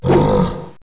attack bloater

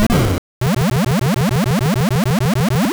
Sludge Bomb; sfx; attack moves; pokemon; gen 2; gsc

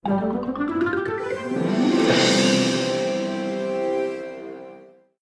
Card Stack Stinger; Zak Storm Super Pirate; mobile

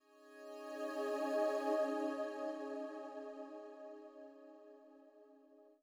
Calm Pad; super mario maker 2; Peaceful SFX Raws - Otoasobi

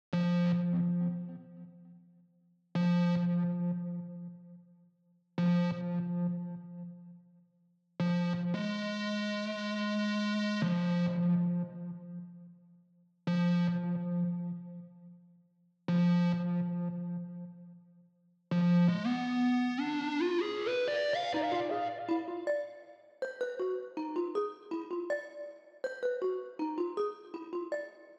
Synths

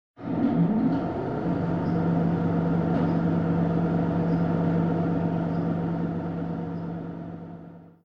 Elevator Moving Out

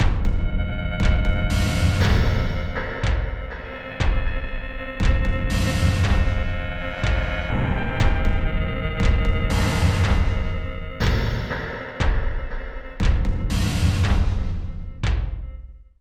Success Tonal B6

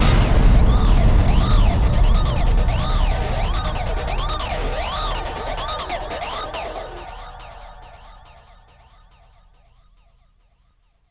Bomb Timer; Unreal II The Awakening; Acheron

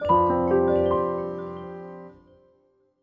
Jingle Opening; Clubhouse Games: 51 Worldwide Classics

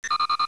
SFX error Beep

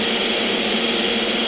TURBO Mustang

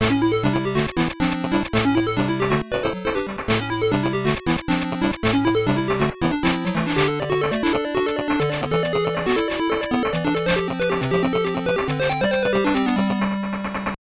Jingle; fred couples golf 1994 game gear

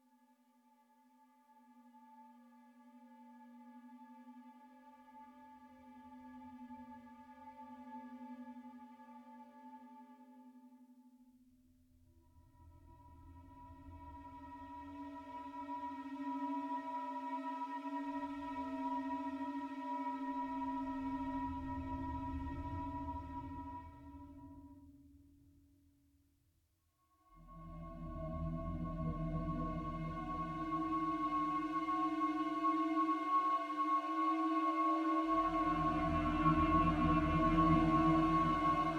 Music Neutral Intro; Sifu; H3 Level Area=Entrance

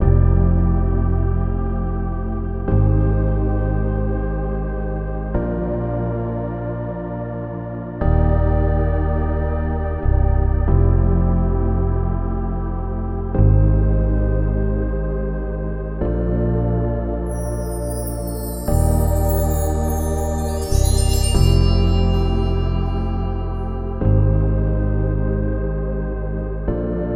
Bass and Synth Loop; Headlander; Arrival

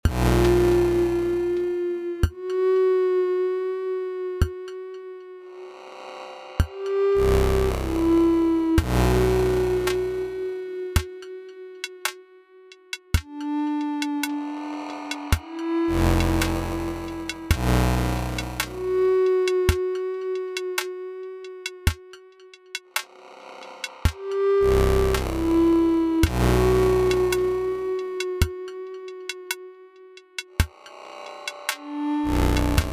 Battle Undead; inscryption

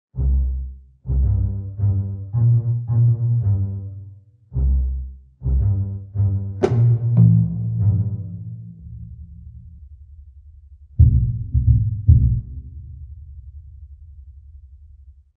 Jungle Level

